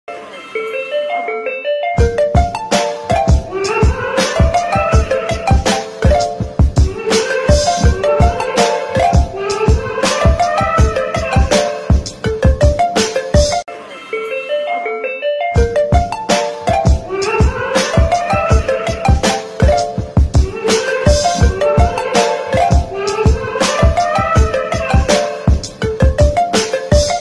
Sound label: Ringtone